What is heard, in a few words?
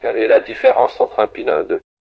jingle